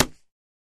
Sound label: Boxing Glove Hit Slap